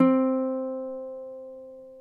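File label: reference pitch uke std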